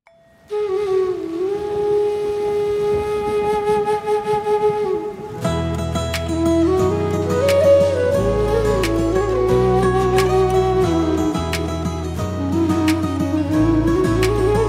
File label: instrumental music ringtone